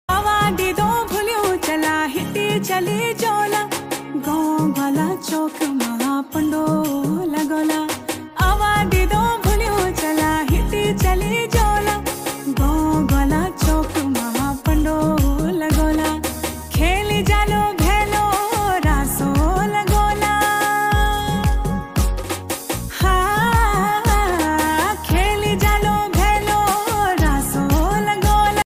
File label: uttrakhandi ringtone